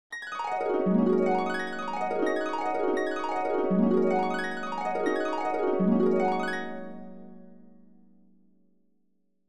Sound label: dream harp